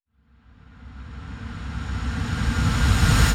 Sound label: Uplifter